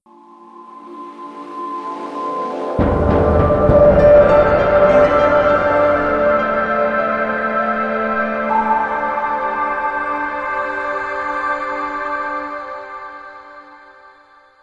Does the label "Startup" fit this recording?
Yes